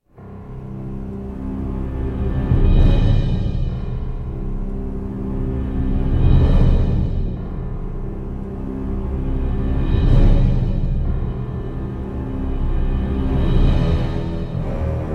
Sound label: no choir